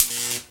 chain lightning; Risk of Rain

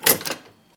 pickup; Risk of Rain

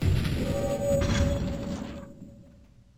death; HLD

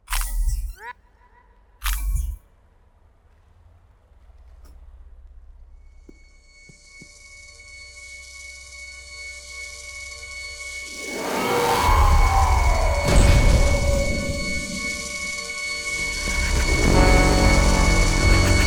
fourth module collect noise; full; HLD

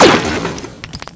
Guard Death; Risk of Rain

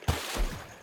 enemy jumping out of water; HLD

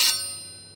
Crit; Risk of Rain

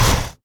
Lizard G Hit; Risk of Rain

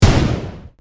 Riot Grenade; Risk of Rain

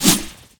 Samurai Shoot; Risk of Rain

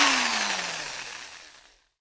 drill; Risk of Rain

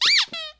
Squeaky; Risk of Rain

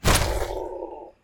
enemy death; HLD

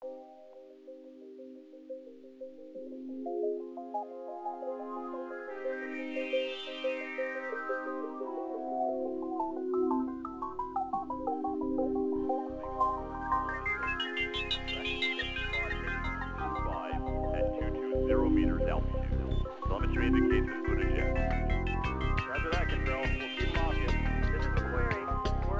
music; mechwarrior4

